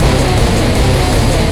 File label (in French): boucle